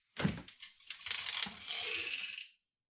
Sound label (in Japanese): シンクの下の引き出しを開ける音